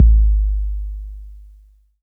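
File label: bass drum 808 Tape Decay E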